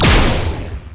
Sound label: deathray; LoL; viktor